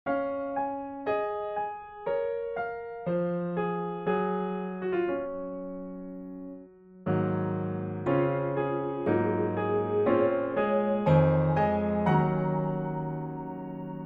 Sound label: last guitar first